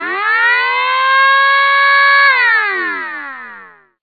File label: Hootronic Tone